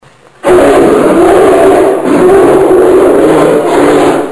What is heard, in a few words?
polar bear; animal sounds